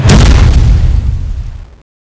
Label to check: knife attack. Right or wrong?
Right